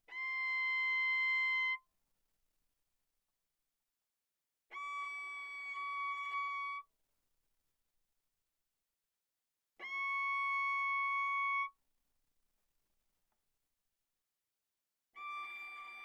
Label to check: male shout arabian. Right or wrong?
Wrong